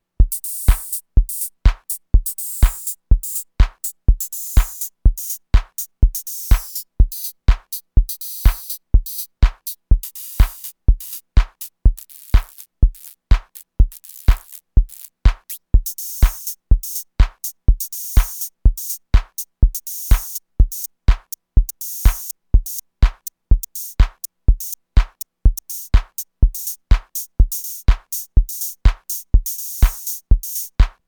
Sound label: drums hats deliberately loud reduce grain then adjust decay